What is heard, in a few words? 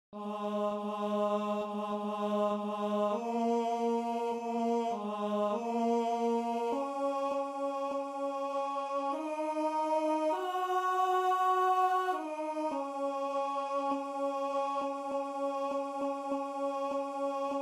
in monte oliveti tenor